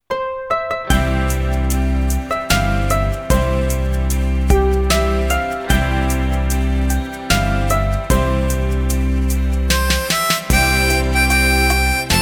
Harmonica to listen to, blues harmonica; Kumbayah my Lord

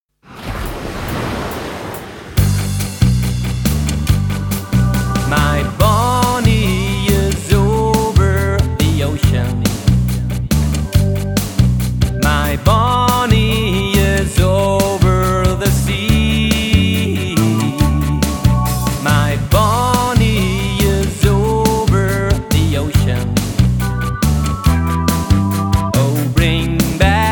My Bonnie is Over The Ocean To play along

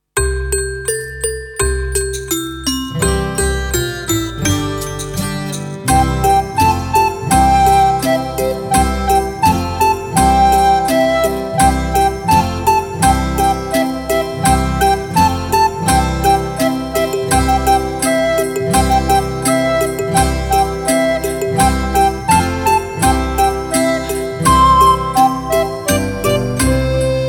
recorder

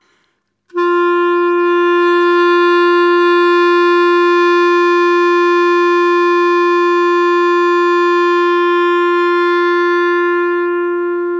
Clarinet tuning G

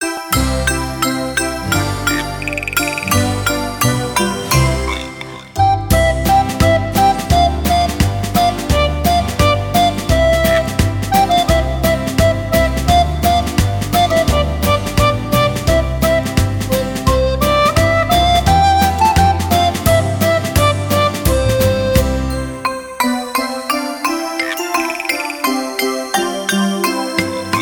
recorder

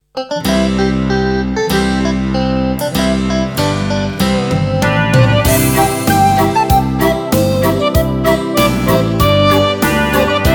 Oh Susanna Listening, recorder

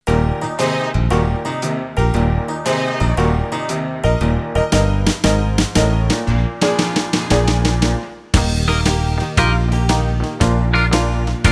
Trombone to play along

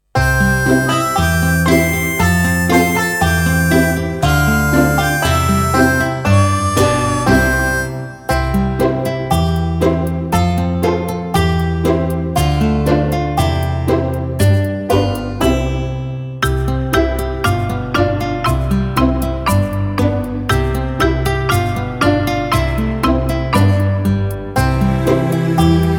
recorder

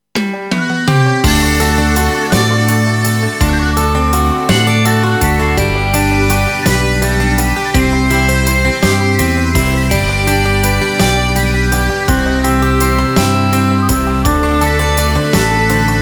Violin to play along; The wild rover

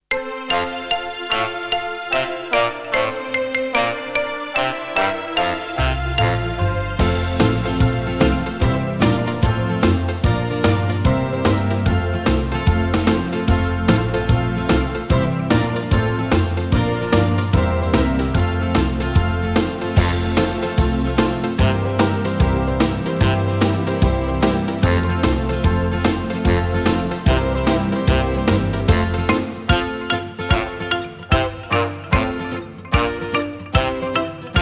Auf unsrer Wiese gehet was, recorder

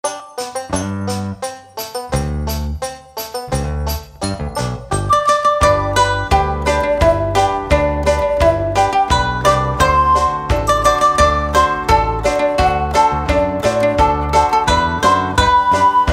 Der Ukulele Song To Listen To

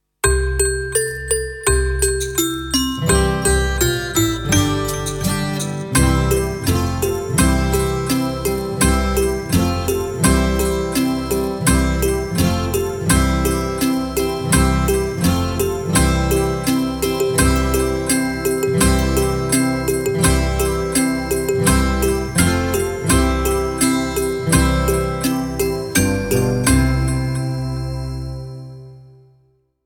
recorder

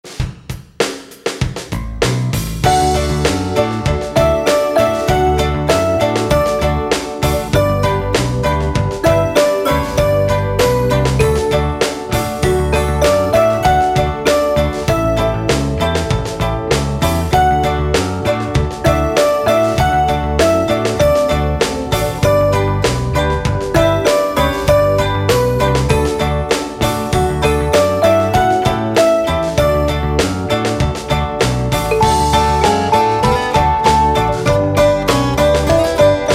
Gloryland, ukulele

